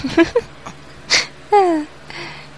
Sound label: odd giggle